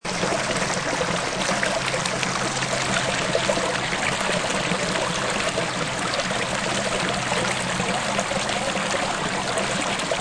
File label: Mountain Stream, High quality upclose mountain stream running; Rivers; Nature